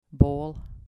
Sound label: bowl